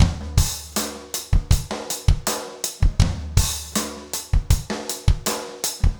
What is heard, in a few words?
trip hop rims